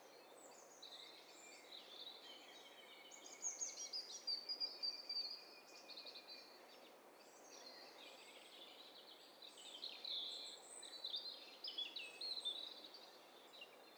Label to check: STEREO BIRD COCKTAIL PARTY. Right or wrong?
Right